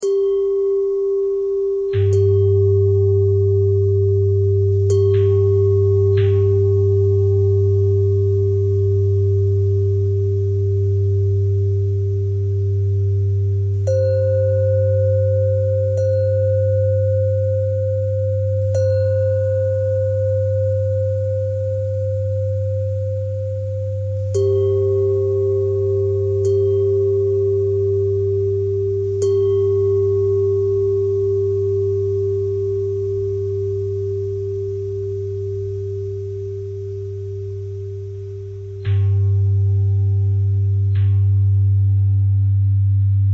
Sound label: bell bell thread simple, bells